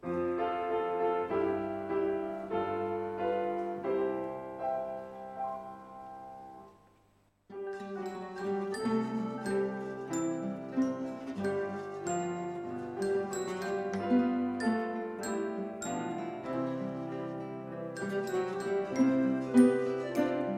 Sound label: Instrumental